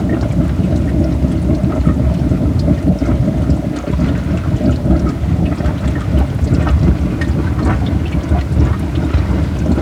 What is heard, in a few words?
ambience water container